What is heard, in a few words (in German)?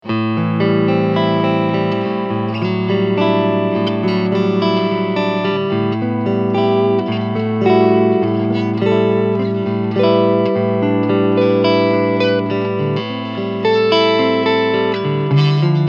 Laney Cub Super8 Cleansound Volume 12 Uhr Tone Full